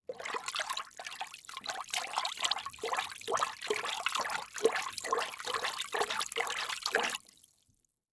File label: Liquid Water Movement In Plastic Bucket Hands Splashing Constant Slow Short